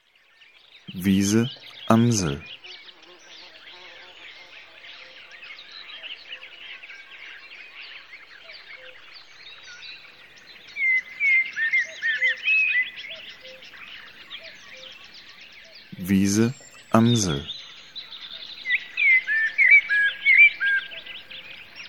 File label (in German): Wiese Amsel; Natur